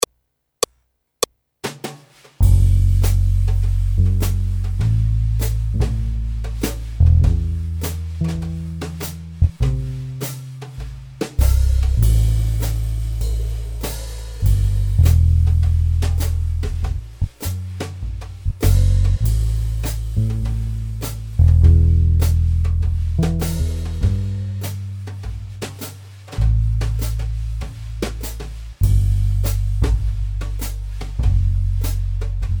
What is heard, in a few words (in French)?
Accompagnement seul